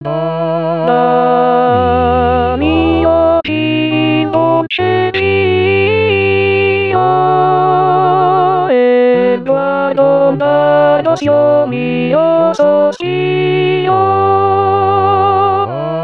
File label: alto